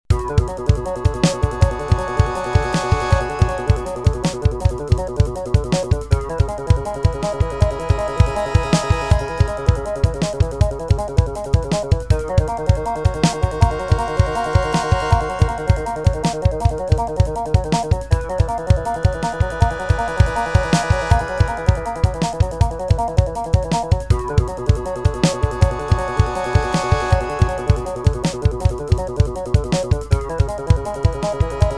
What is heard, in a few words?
city part drums; unsighted city 3 part drums